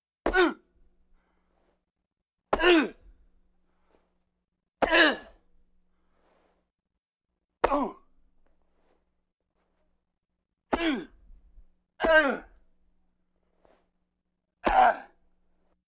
Vocal effects breathing Moaning Baby

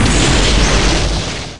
Explo Medium; SFX Kit; Destruction

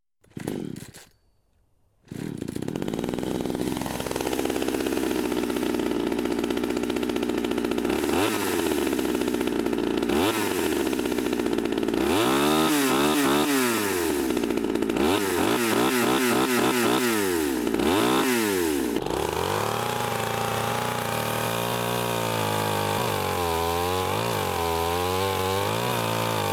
Chainsaw, Scary Sounds; 1001 Sound Effects; Halloween Horror Sounds Effects

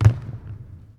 Bodyfall Basketball Court

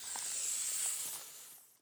Sphygmomanometer, Medical; Blastwave

